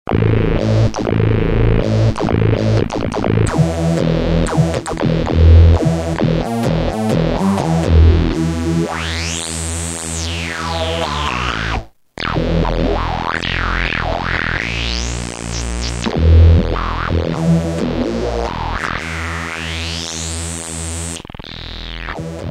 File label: Legato Synth; Roland M-DC1